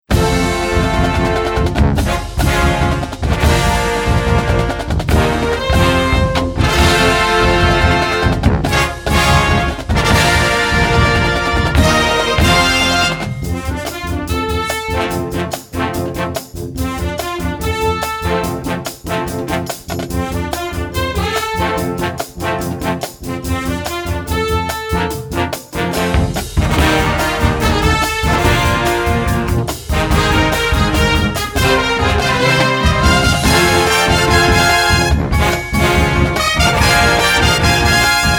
Marching Band

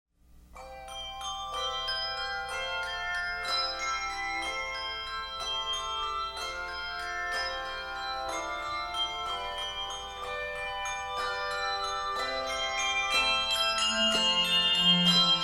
Handbells, Handbell Music; Sweet Hour Of Prayer; Publisher: Jubilate Music

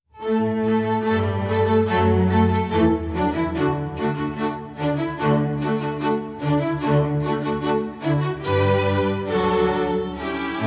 light cavalry march; suppe; kendor music

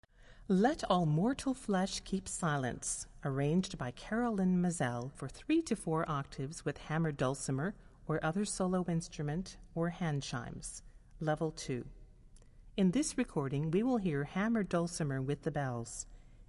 Handbell Music; Let All Mortal Flesh Keep Silence

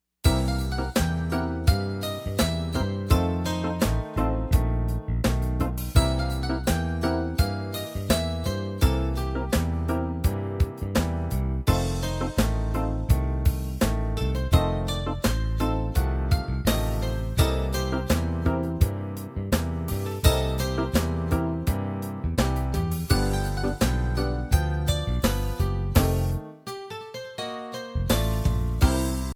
Mountain Top Blues, Piano Styles

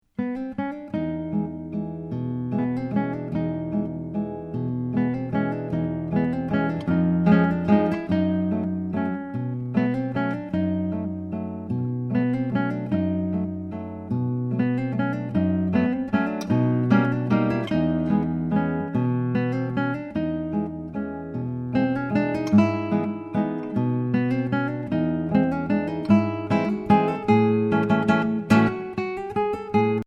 classical guitar

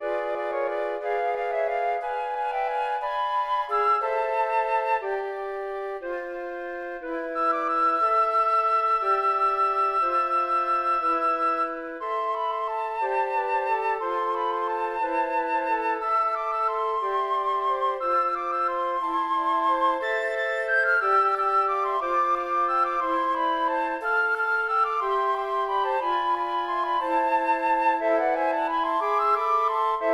Ukrainian bell carol, woodwind; Christmas

